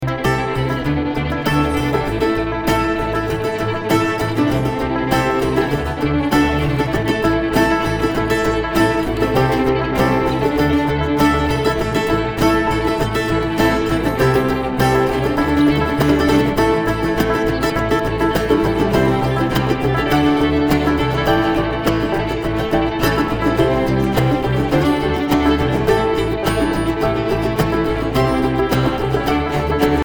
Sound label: Traffic Jam